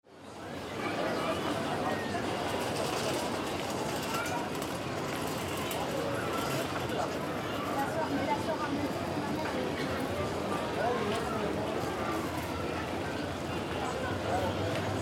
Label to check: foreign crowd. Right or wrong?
Right